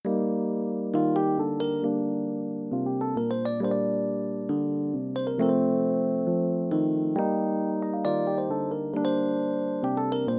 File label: Instrumental